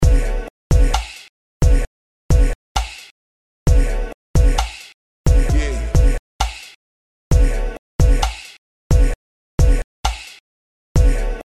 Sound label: Ball Like Swoosh Ringtone